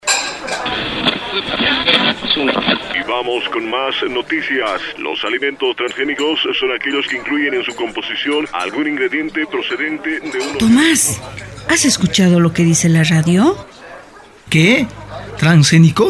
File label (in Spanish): jingle transgenicos